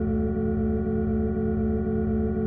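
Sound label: Machine Loop; 3D Scifi Kit Starter Kit HD; Raumschiff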